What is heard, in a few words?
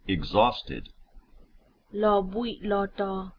exhausted